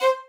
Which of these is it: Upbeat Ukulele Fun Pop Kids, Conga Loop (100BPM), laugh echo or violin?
violin